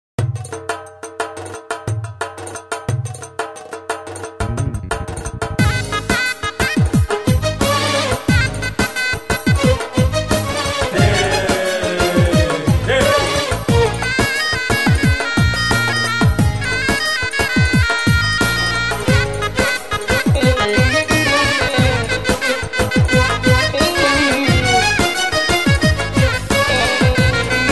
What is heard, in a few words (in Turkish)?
Antep Ciftetelli